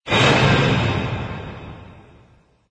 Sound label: Super Horror String; clown house palya o evi